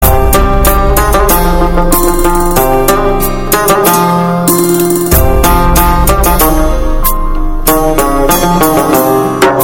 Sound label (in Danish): tryk på guitar, ringetone